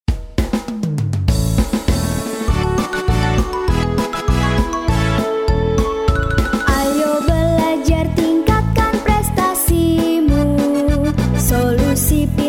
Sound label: Opening Splash; Splash Deskripsi; Teks Tanggapan Deskripsi